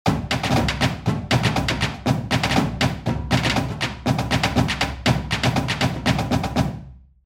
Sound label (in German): guggemusik